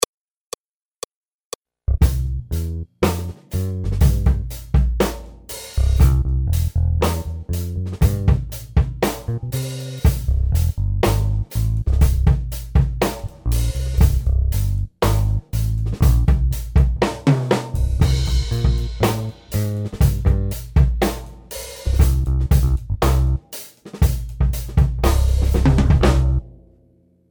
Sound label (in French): Accompagnement seul